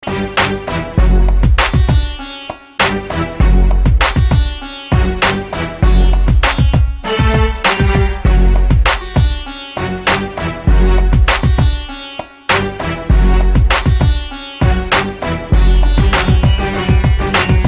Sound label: Arabic Instrumental